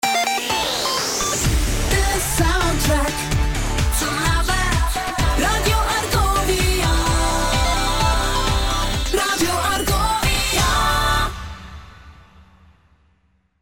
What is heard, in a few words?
Jingle; Argovia; 2015